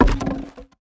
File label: objects, World of Tanks